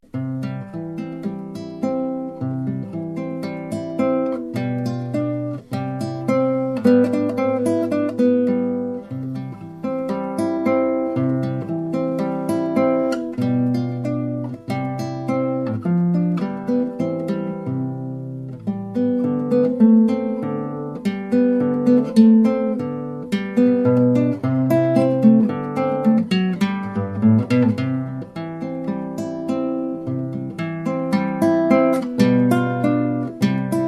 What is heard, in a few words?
allegretto, sor